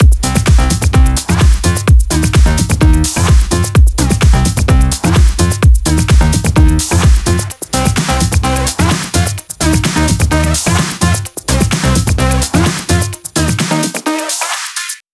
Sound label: 128BPM Mix; Vengeance Samples; VFH1 128BPM Big Tee Kit